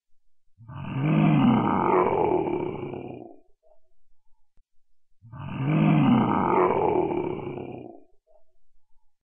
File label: Cartoon monster; هیولا کارتونی